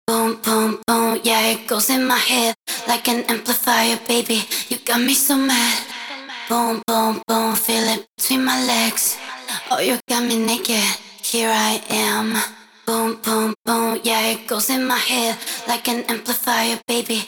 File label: Acapella Vocal